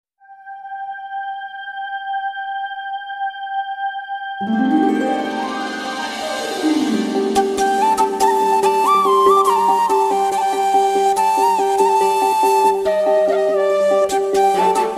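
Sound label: Love Flute background music Ringtone; Seema Raja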